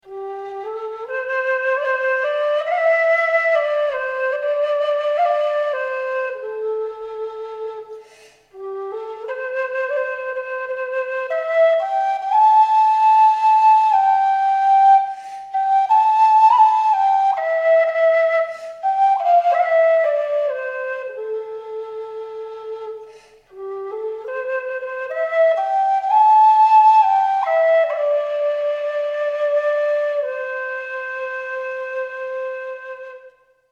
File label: low g whistle